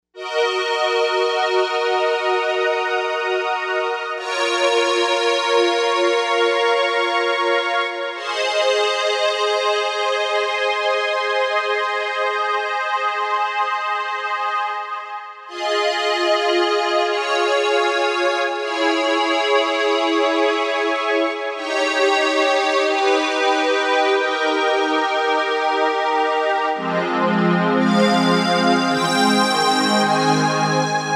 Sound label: Novation UltraNova; Factory Patches